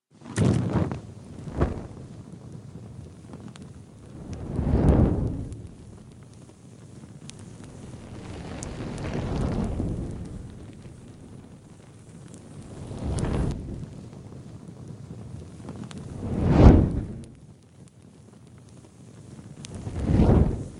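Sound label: torch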